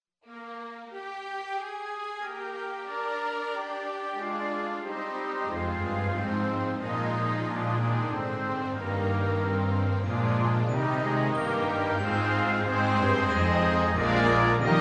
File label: ORCHESTRA 4 HORNS IN F; horn quartet with orchestra; The Day Thou Gavest Lord Is Ended St Clement